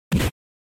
Fighting Hit

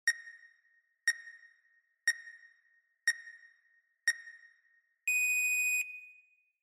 Countdown

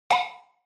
Onoma Pop High

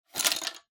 Pencil Case Rummage

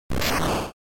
Noise; GB General

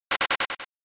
SNES RPG Run

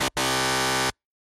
Quiz Wrong Buzzer

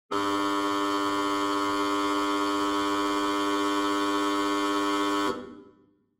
Opening Buzzer

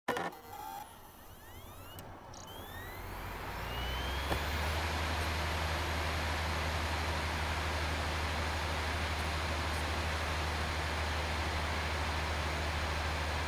Optical Disk Drive Operating Noise

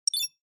Cyber

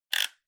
Cutter Click

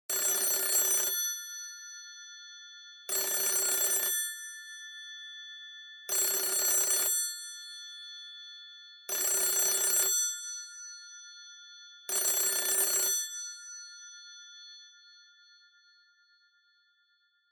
Rotary Phone Ringtone

